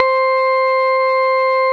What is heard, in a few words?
SYN Synth; Best Service Gigapack 1